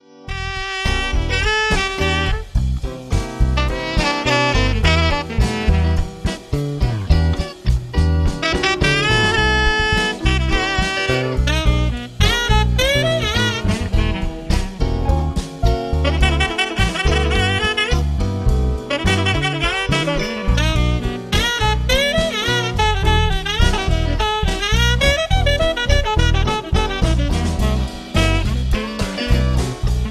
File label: Ambient Jazz Atmosphere